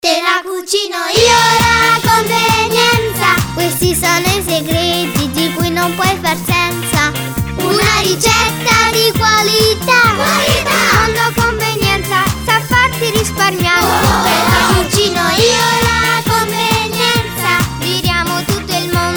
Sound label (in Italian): Jingle